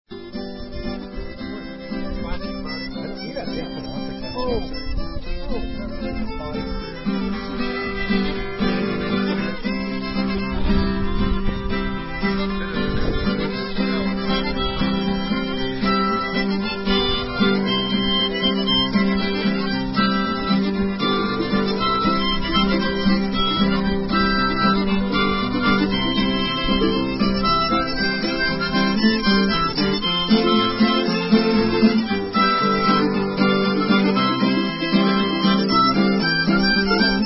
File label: Triangle Blues C